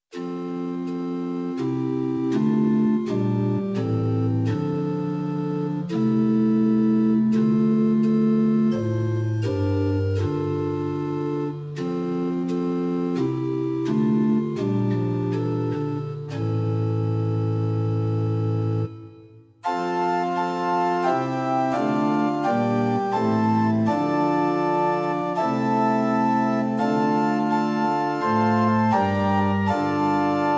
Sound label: Pitch 440 Temperament Meantone